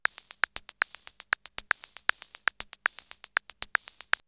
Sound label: CR78 BeatBox, drumloops, DrumMachines